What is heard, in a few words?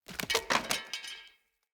Barrel Wood Hollow Medium Destroy Chunk